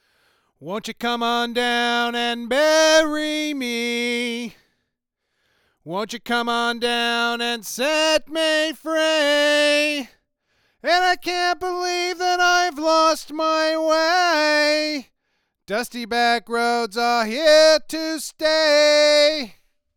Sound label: Apex460 Stock Fig8 Vocals; APEX 460 TO C12 DIY MOD